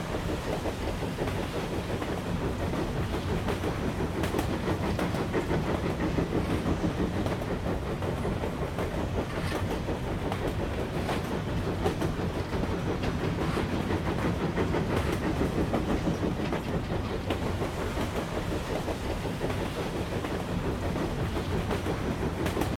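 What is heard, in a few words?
Scottish train local interior; the 39 steps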